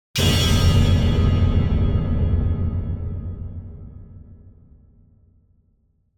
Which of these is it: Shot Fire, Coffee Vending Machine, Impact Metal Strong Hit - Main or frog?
Impact Metal Strong Hit - Main